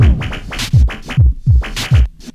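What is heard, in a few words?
weird beat, Drum loops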